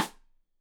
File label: Side Stick Stereo; Deeply Sampled Drums; SMDrum